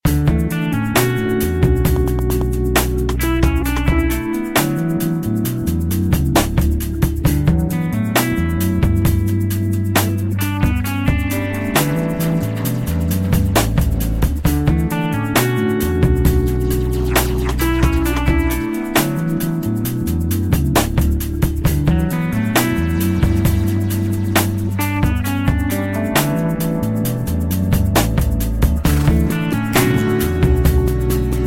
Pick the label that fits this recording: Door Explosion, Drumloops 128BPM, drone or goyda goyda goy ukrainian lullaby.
drone